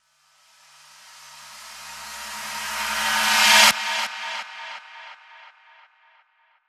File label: Percussion